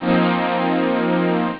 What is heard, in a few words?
nuke, synth